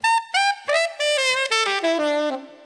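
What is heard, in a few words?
Squeak Squeak, 4beat D major 90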